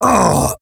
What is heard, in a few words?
death; player